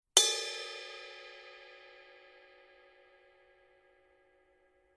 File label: Color Sound 900 Blue Ride Paiste